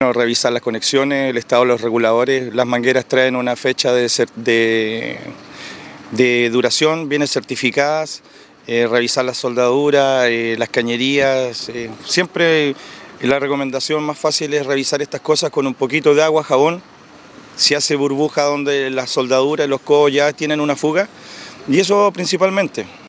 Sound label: EXPLOSION